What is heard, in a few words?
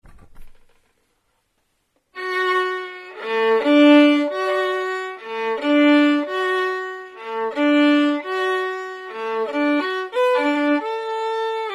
lullaby harmony, violin only; Brahms; duets for violin only